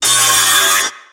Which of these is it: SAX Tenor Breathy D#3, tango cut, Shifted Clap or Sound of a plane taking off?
tango cut